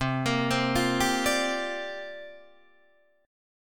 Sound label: C 7th Suspended 2nd Suspended 4th, Guitar, Open D